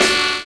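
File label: SAX N GUITAR; Univers Sons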